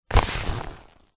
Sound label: paper glossy thick pick up; foley; zapsplat